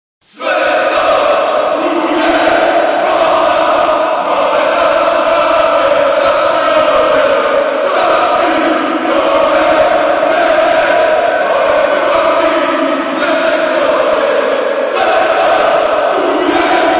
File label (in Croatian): zvona zvone; svetog duje